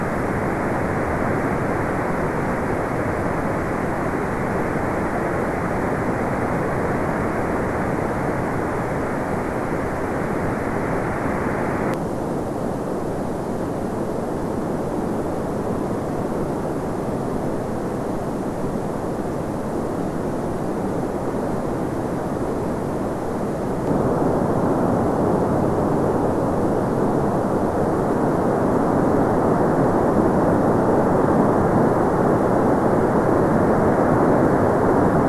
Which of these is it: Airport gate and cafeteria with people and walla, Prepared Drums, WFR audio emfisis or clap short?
WFR audio emfisis